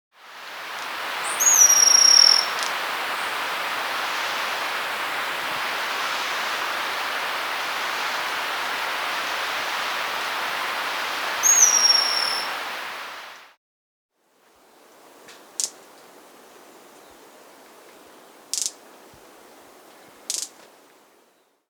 Bronze-Olive Pygmy-Tyrant (Pseudotriccus Pelzelni), Andean Birds; S. America